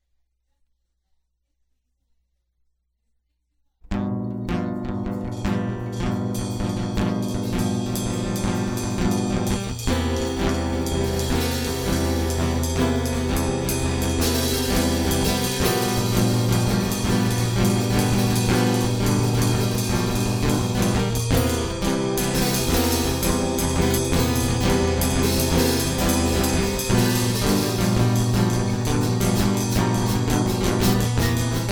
Instrumental; hyper terrestrial

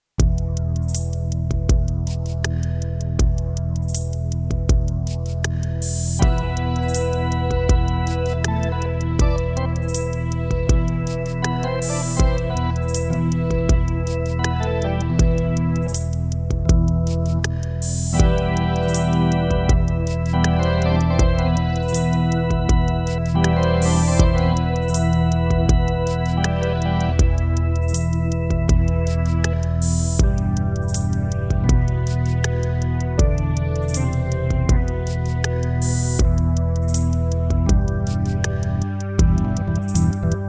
Prelude

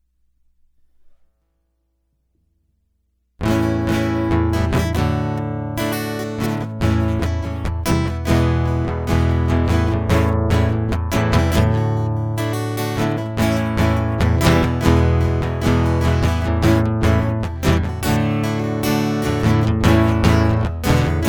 Guitar Loop

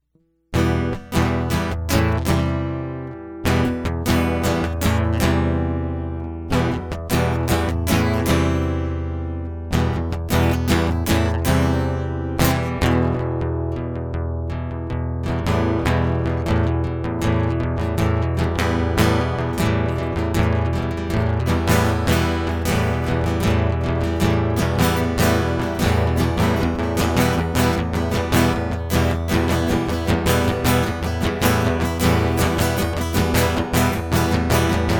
Guitar Loop

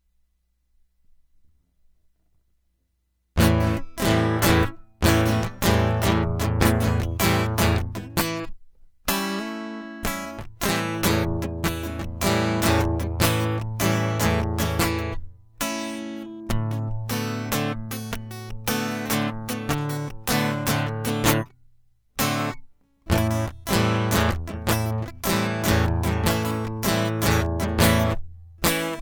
Prelude Loop; hyper terrestrial; Exasperated; Just A Heartbeat Away

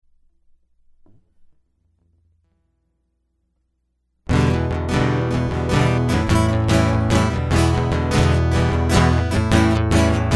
Guitar Loop

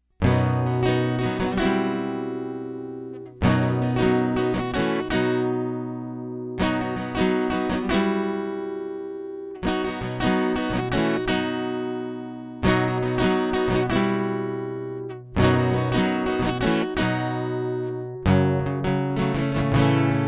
Guitar Loop

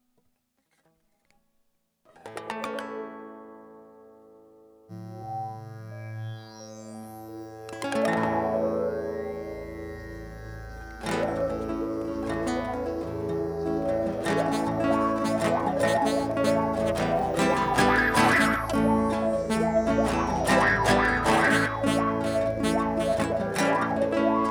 Instrumental

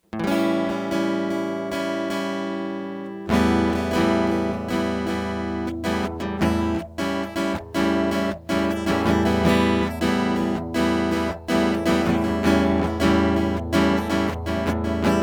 The Spot Guitar; hyper terrestrial

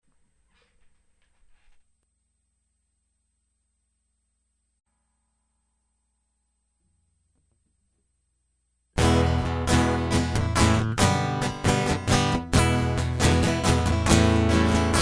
Guitar Loop

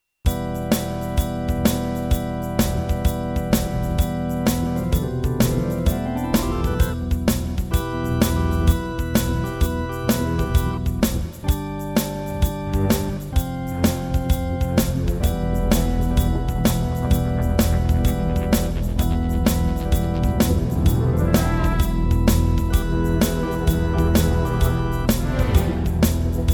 Bombastic Instrumental prequel; hyper terrestrial

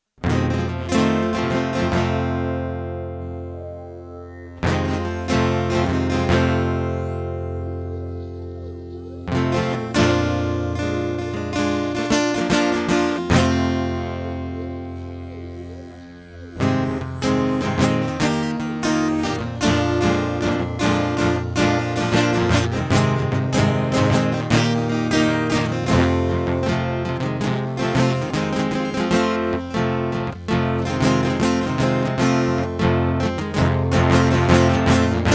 Instrumental

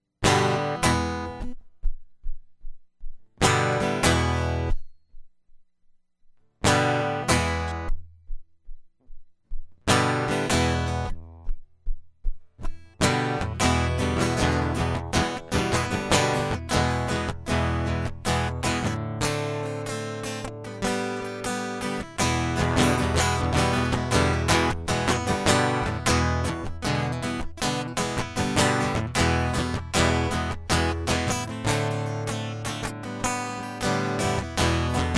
Asteroid Paranoid Loop; hyper terrestrial

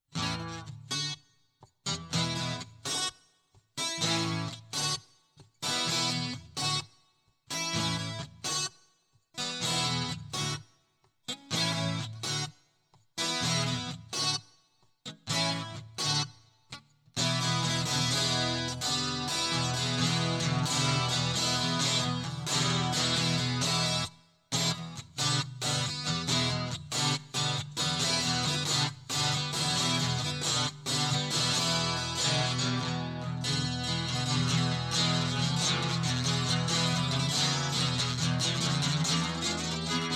Guitar Loop

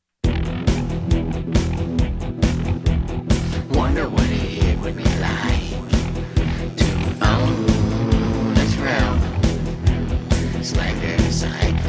Alternative Rock

